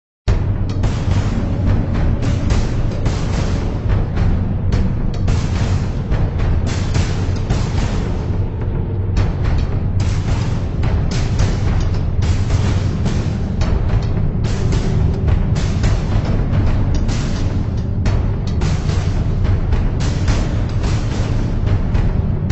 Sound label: Combat Music; Alice: Madness Returns unreleased original soundtrack